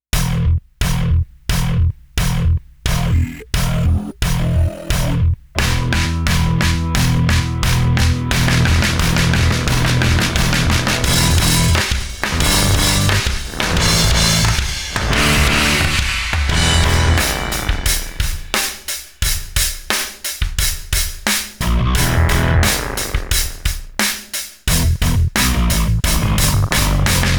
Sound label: New Bass